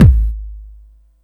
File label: puffy kick g sharp key